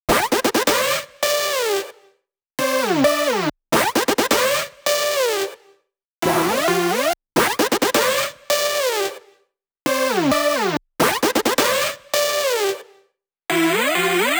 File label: 132BPM Melody; Vengeance Samples; Commerce Kit